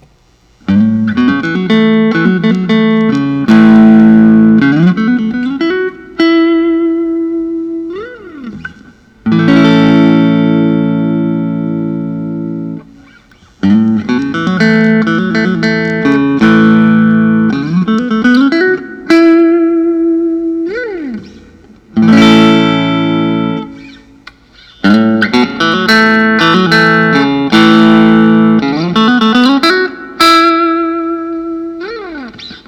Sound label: fender telecaster blonde